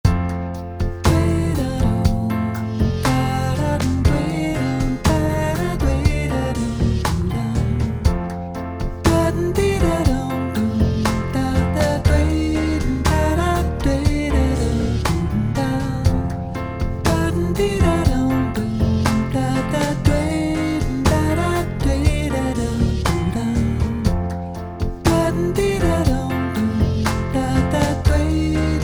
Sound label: Relaxin